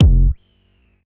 Tech Drum Hit, techno 120bpm

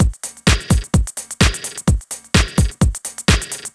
128BPM No Perc, techno; drum fx; Beats

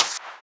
Snare, techno drum

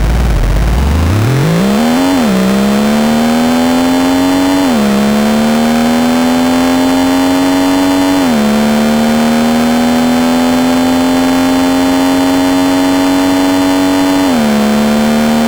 YM Motor; SFX; CHIPSHOP DELUXE; CHIPSHOP HITS

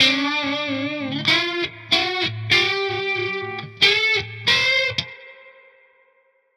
Guitar Line, hiphop; musicradar; 94bpm

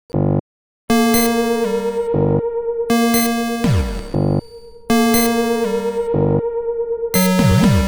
Synth A#, 120 BPM; CHIPSHOP DELUXE; CHIPSHOP LOOPS

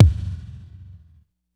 Tech Drum Hit, techno 120bpm

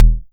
Vermona Kick

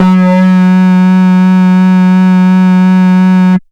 Bass B dist F#3, drumnbass 170bpm; musicradar; Bass B Multis

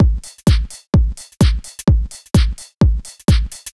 Beat 128BPM (Drums), techno; techno drum fx

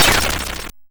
Snare, YM, CHIPSHOP HITS DRUMS; ChipShop Deluxe

